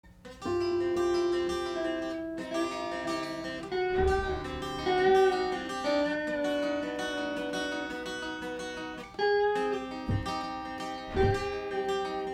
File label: acoustic ditty